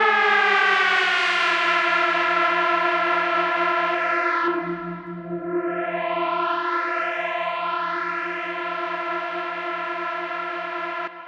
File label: FX, Electro Shock, Afterhours EDM; Sonic Foundry (Sony Creative Software)